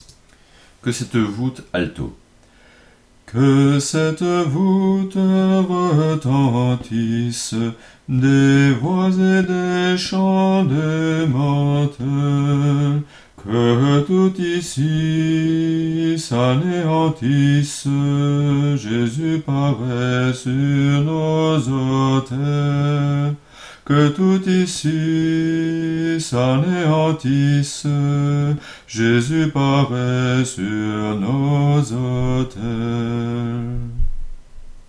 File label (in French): alto; Que cette voute